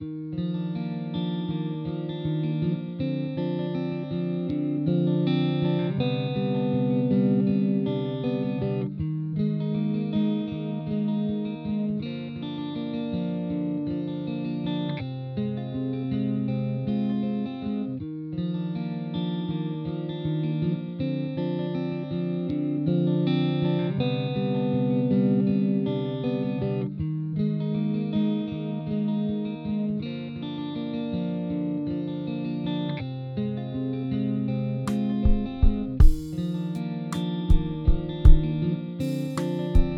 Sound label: guitar floats over the drums